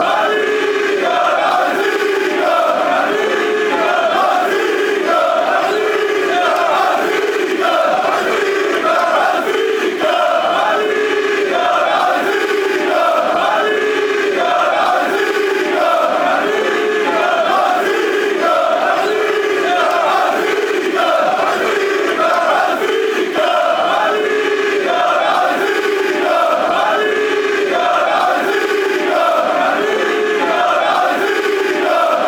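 benfica benfica duo, football songs and soccer chants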